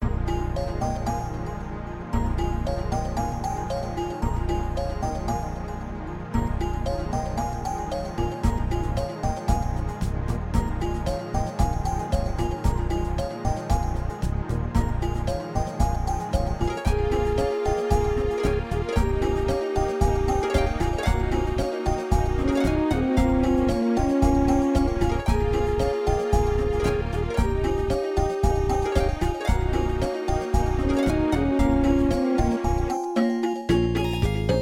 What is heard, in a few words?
Cave Music